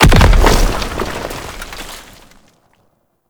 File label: Small Bomb Explosion; Gameplay; Warframe; Tau